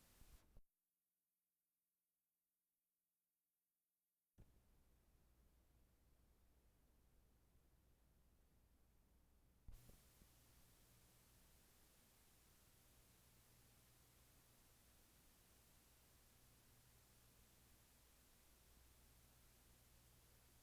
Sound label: farfisa